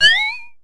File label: Boingfx; Buffet